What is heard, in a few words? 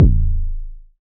nice bass drum sound A key, steel kick drum tuned to the A note; kick drum samples